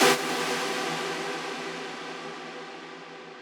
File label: lead